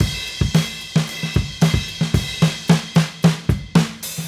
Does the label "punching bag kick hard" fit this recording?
No